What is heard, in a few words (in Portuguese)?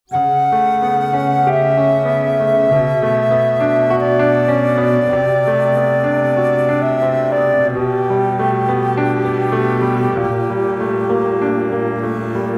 Instrumental, Violino; Violino para os Pequeninos